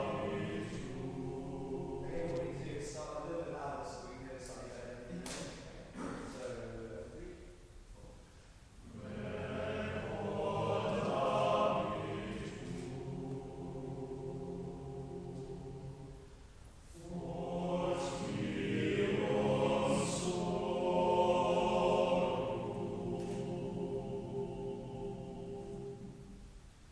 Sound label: choir